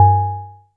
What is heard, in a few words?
DULL, PERC HITS; Zero-G Ambient 1